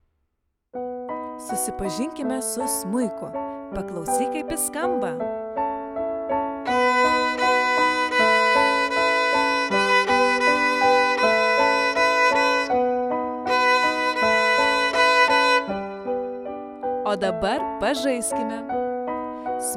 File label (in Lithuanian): Saksofonas; Susipazink su instrumentu